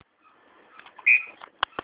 Whistle

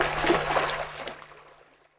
wade; Ace of Spades

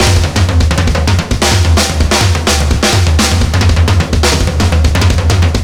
drums; Left 4 Dead 2; zombat; horde

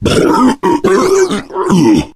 male boomer shoved; voice; Left 4 Dead 2

